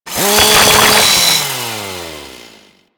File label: Ghoul Sawman Saw Ride; Warframe; Grineer Ghouls